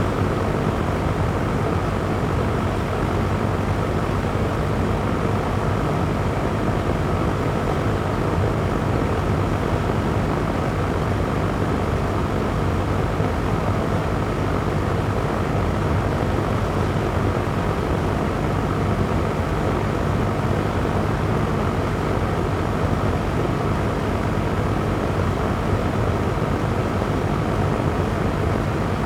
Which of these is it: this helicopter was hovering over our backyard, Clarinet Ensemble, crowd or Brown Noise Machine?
Brown Noise Machine